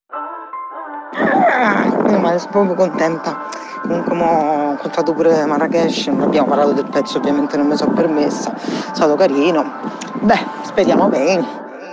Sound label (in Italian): vocale